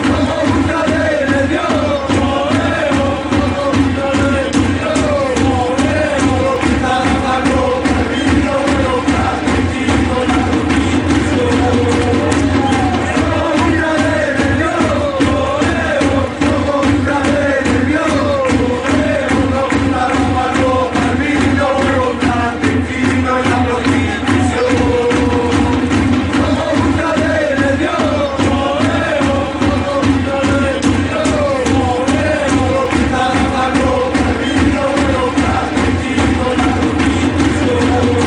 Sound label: chants; somos ultras de nervion